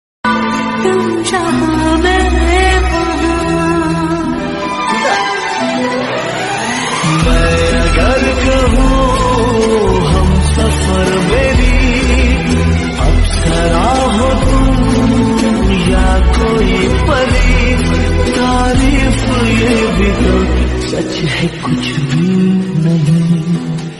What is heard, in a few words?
Lofi Hindi